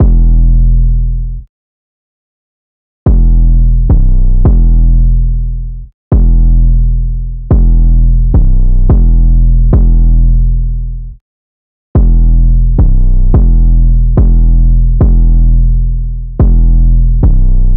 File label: 808 loop 108 E; Slate Digital BASSHOLE Sample Pack; 808 Loops